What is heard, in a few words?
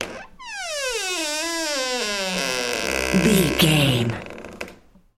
door creak long